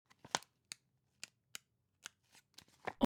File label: object paper magazine browse